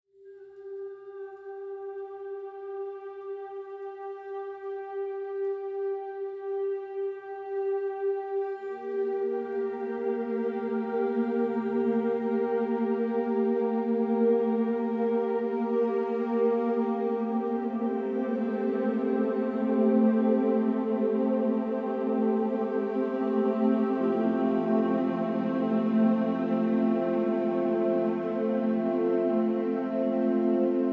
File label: Spa Music